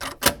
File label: Door unlocked